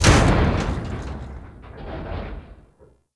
Ship Hull Impact Small; Railjack Hull Hit Effects

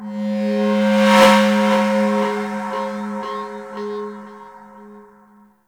Temple Bells Swell; Ambience; Corpus Ship; Temple One

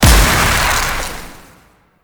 Impact Medium, Weapons; Warframe; Duviri; Incarnon Adapters

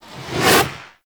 Leap Whoosh; Warframe; Venus; Venus Terra Moa; Abilities

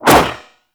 Golden Band Staff Whoosh; Warframe; Monkey King

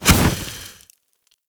Fire Stop, Flame Thrower; Warframe; Ignis Wraith

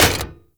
Short Sword Medium Impact Dmg Metal; Weapon Impacts; Player; Swords; Combat

